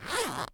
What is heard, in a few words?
Zipper Regular Pull